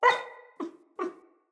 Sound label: Wolf Cub Trapped Call Far, Animals, Wolf Cubs, Vocals